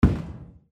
footstep

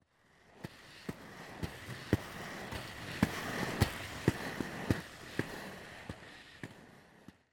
skateboard

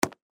baseball glove catch

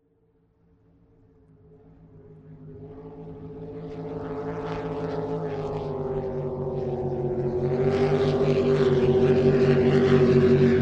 Boats Passing

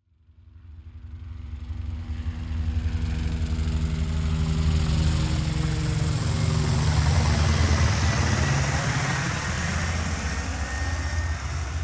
Helicopter

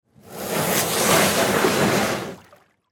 Boat Pushed In Water

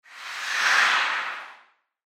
hockey stop

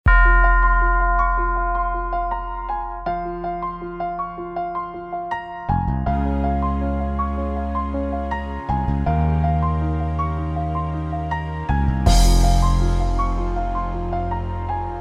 Build Up; cinematic

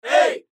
Crowd

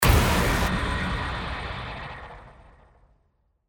Blast Shatter

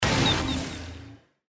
Blast Metallic

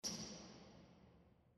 gym floor squeak

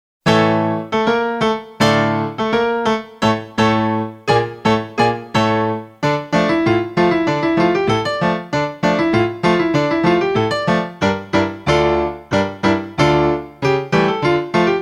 Silent Movie Cakewalk; Soundzabound

